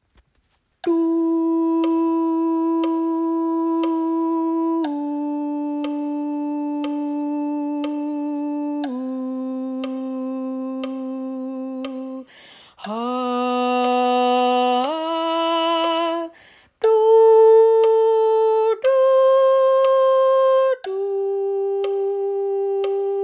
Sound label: Soprano